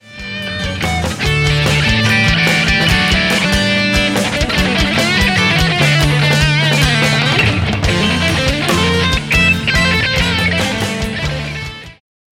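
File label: solo with kiss gliss, guitar; quick pitch shifting kiss; Zystrix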